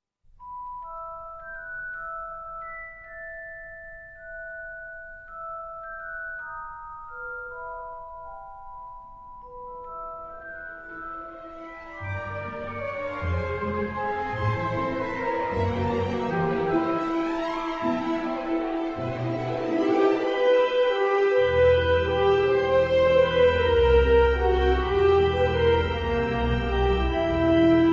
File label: theme music; harry potter